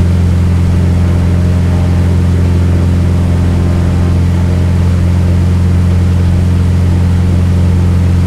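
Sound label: zlin cockpit left, engines; War Thunder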